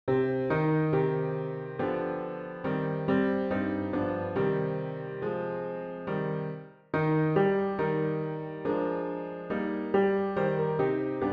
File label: piano only; I Want Jesus to Walk with Me